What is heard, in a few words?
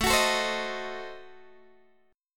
A Diminished 7th A down Guitar Open D